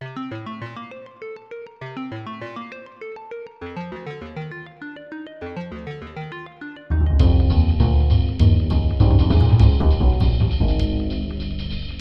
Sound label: Underground; super mario maker 2; SMB1 Underground - Edit